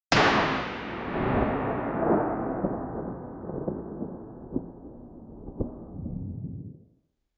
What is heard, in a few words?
advanced lightning energy